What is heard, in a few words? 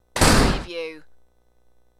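Small Metal Door Slams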